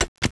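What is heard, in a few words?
big button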